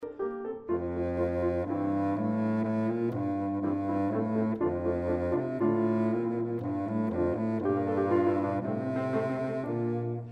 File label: Adagio; Allegro, Big Bassoon